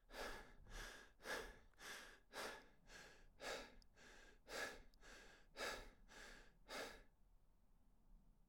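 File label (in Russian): глубокое человеческое дыхание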